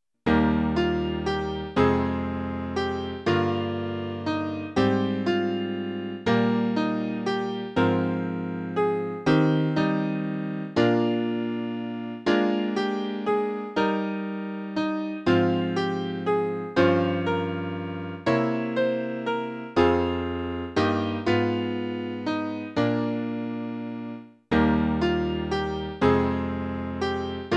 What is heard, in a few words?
piano